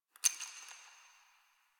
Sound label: Ice Crunch; Warframe; Ambience; Nineteen Ninety Nine; DE